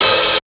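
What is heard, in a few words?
ROCK 132 hi-hat open, Big Beat Drumloops; USB Soundscan vol.25; AKAI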